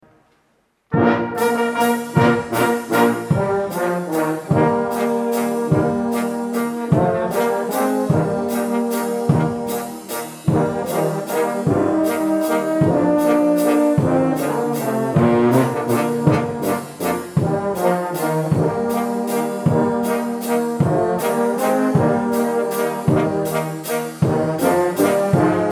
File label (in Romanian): Fanfara